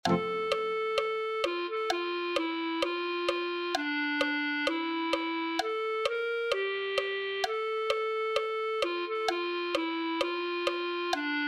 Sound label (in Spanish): clarinete